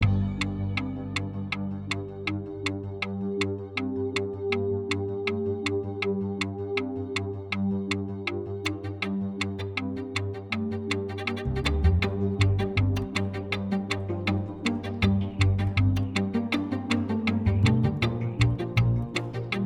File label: Puzzles Minigame Loop